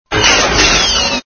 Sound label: SFX sound (saber hit); Red Baron